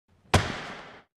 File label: blip blop; gun sound